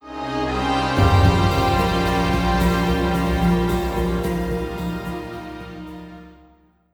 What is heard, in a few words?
Marsh Play Stinger